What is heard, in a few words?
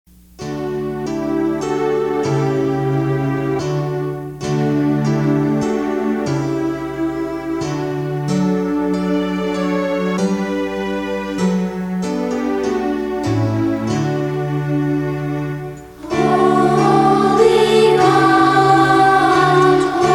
Trisagion; ENG